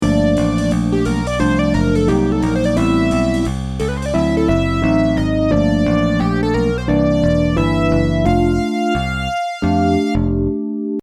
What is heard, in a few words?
jazz midnight